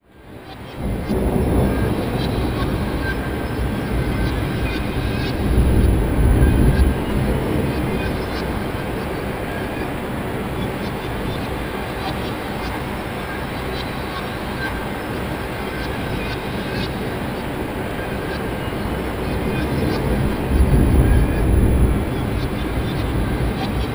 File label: Trap Ambience, Background Ambience; FX; Cymatics; Cobra Hip Hop Sample Pack